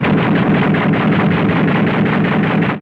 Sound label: Flak